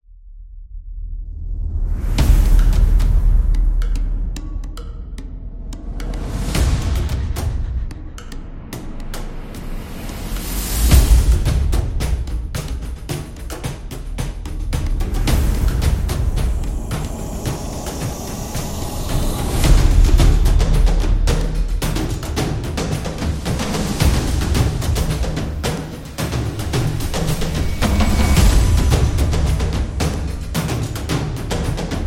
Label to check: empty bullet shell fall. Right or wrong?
Wrong